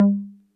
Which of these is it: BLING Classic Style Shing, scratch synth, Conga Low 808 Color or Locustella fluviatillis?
Conga Low 808 Color